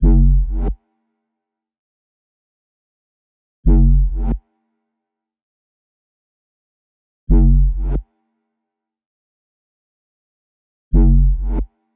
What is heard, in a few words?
132 BPM F# Min Bass, UK Garage; Melodies; Loop Stems; Cymatics; GHOST (Beta Pack)